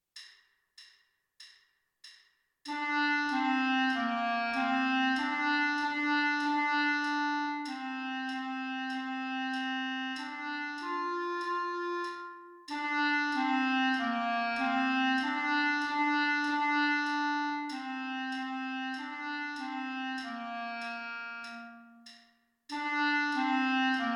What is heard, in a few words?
clarinet